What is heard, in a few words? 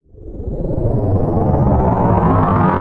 Sound Trapdoor Falling Wind